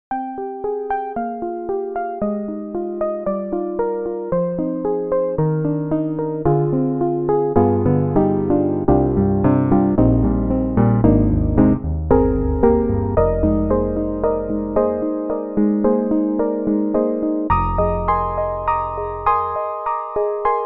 FM Soft Grand; Steinberg HALion 7

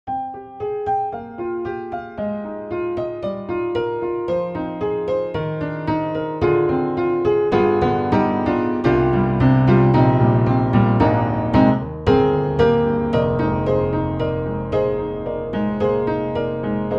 Intimate Vintage Upright; Synthogy; Upright Pianos; Ivory II

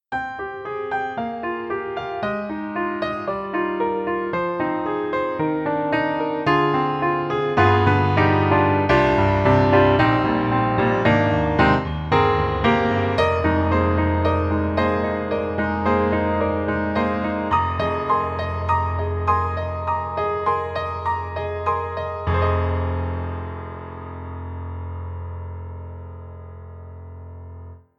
Pearl concert grand; IMPACT SOUNDWORKS

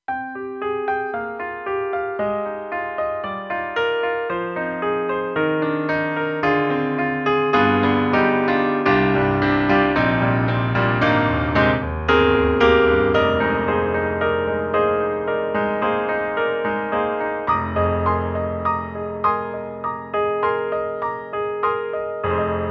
Grand PF On Stage; KORG M3 XPanded Program

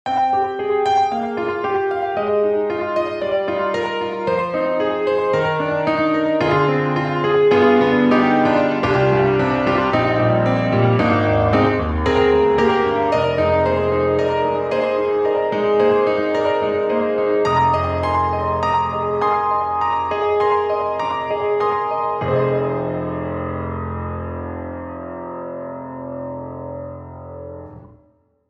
Grand Rhapsody Piano A Distant Flange; Waves

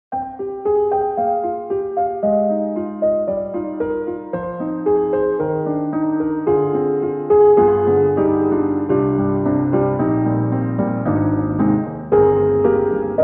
Keys Studio Grand Grand Noir; XLN Audio; Addictive Keys

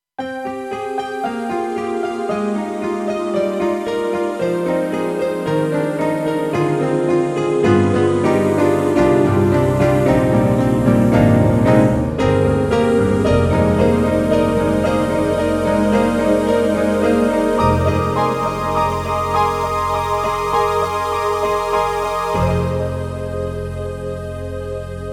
Piano Guitar Pad; KORG M3 XPanded Program

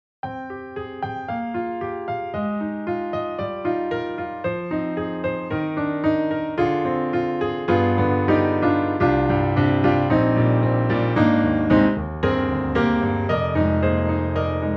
Keys Studio Grand Wide Ribbon; XLN Audio; Addictive Keys

